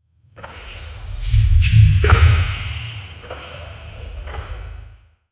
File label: wyvern dragon steps sound